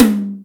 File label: Room Hi, Acoustic Tom; Yamaha DTXpress